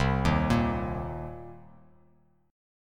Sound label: C Minor 7th, Bass Standard; arpeggiated; JGuitar